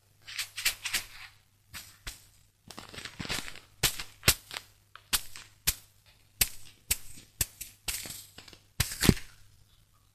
stick

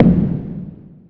kick

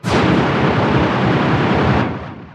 air feeding

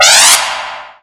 motion; animal

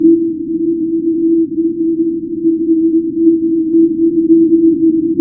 on board spaceship

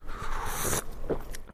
sip; animal

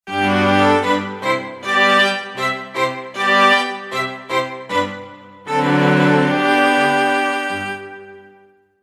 classic, jingle

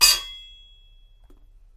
metal hit

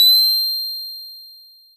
find

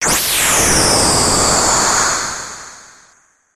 warp

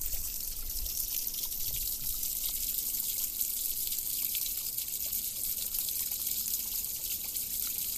drain

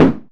punch

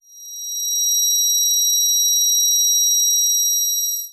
shine

